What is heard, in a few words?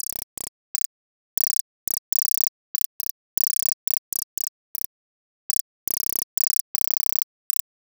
Glitchy, Beats; Sonic Foundry (Sony Creative Software)